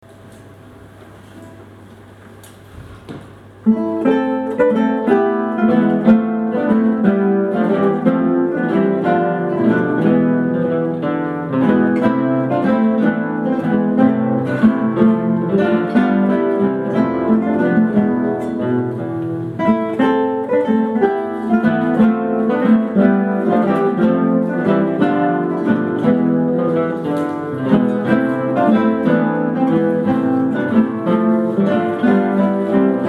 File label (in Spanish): giga Purcell, guitarra